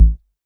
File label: Quasimidi 309, Drums